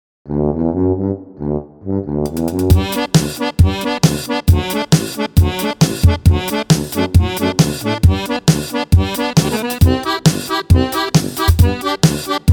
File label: tuba libre 135bpm; revision19